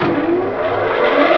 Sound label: LAUNCH